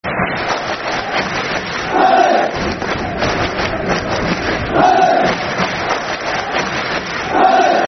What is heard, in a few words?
Palace (Clap), Football Chants; Crystal Palace Cpfc